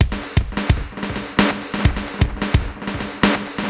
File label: Space Echo HR-16B 130BPM